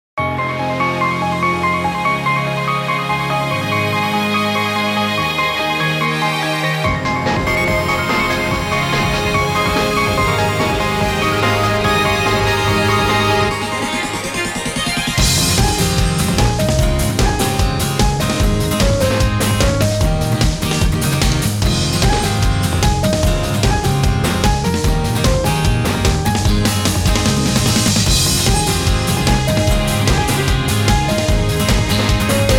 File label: instrumental